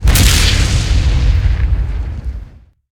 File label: bigWinStart; narcos